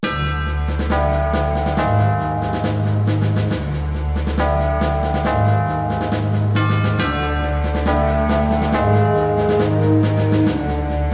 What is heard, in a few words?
Battle; LoV-TDD